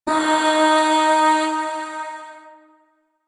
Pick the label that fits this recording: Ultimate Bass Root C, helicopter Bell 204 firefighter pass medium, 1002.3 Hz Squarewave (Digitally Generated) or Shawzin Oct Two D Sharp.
Shawzin Oct Two D Sharp